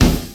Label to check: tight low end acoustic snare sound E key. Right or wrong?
Right